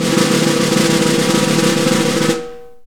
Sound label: SNR SNARE